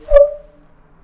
laser sword

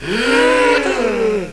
GASPS

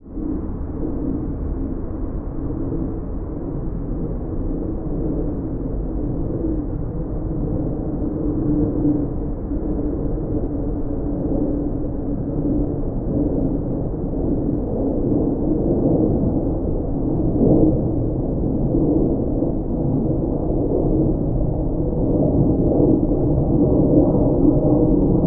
Airplane High

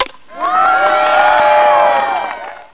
baseball

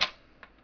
phone pickup

